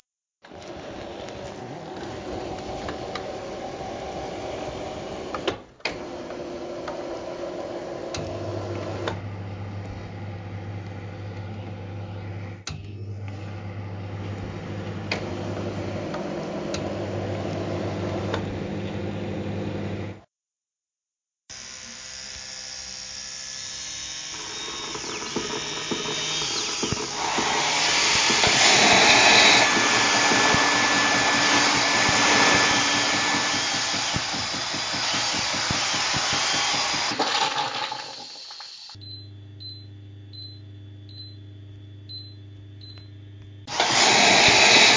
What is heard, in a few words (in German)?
Elektromusik; Marienkaefer